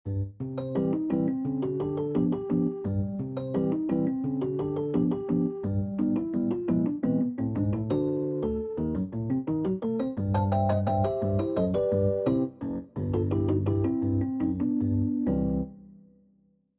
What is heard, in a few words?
ragtime